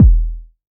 subtle reverb bass drum e key; kick drum samples

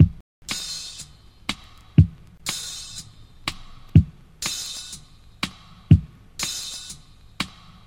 122 bpm drum loop d sharp key

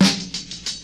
long tail reverb snare g key; snare drum samples

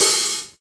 quiet open high hat c key

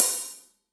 nice open hi hat f key

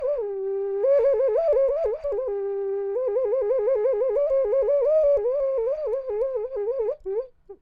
ocarina, Short sample from 3d printed ocarina

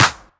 sizzly acoustic snare a sharp key; snare drum samples

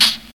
smooth pedal hi hat g sharp key

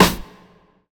clicky snare drum sample f sharp key

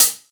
good closed hi hat sample f key

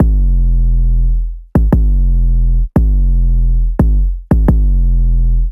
808 E, kick loops, phonk; phonk kick loop saturated degraded 808 e 87; abletunes

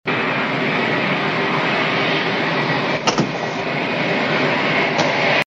plane engine humming

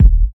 good bass drum sound d sharp key; kick drum samples

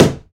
sharp steel snare drum f sharp key

